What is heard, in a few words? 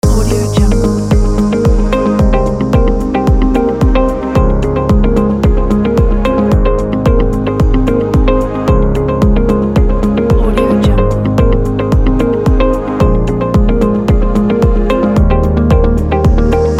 corporate loop